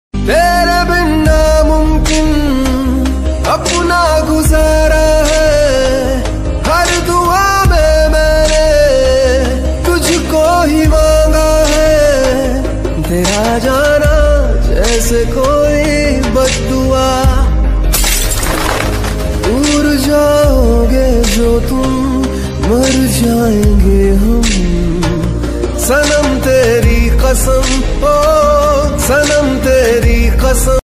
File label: hindi new ringtone